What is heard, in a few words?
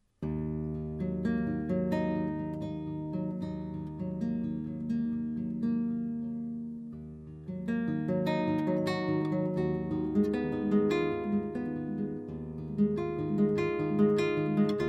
guitar